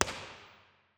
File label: Virtual Drum Overheads Spaced Wide Kit linear IR; 384kHz; INTIMATE